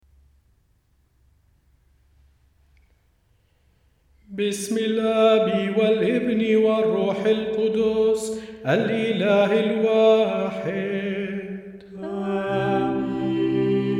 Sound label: Mass Order Opening Rite melody; تحية المحتفل لحن 2